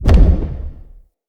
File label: Casting Device Impact; Weapon Impacts; Casting Devices; Galene